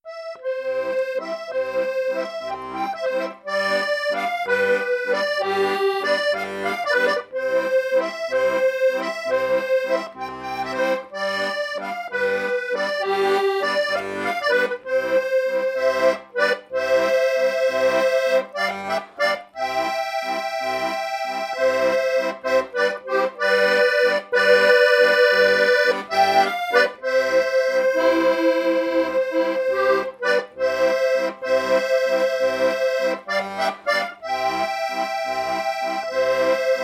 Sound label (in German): Kuckuckswalzer Ouverture